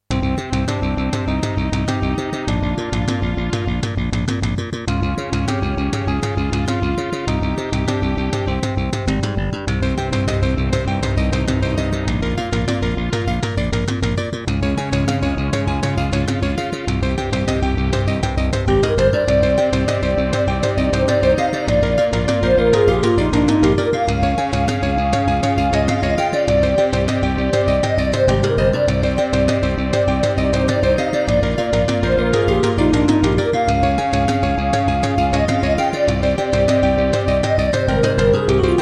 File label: Introduction, Roland SC-88 55MAP; Hocus Pocus